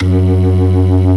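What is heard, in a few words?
B3 low distortion, ToneWheel Organ; E-MU Formula 4000 Series Vol. 3 – Analog Odyssey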